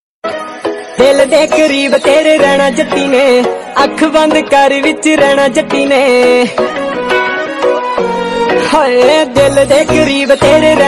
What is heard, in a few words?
love ringtone romentic ringtone sad ringtone